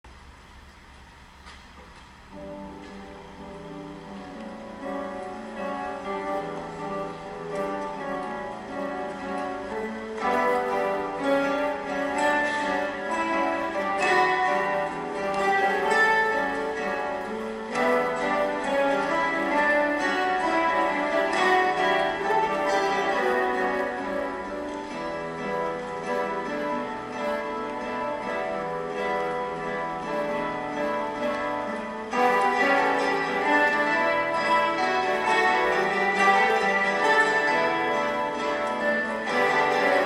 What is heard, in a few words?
Bossa; Musica Latina Facil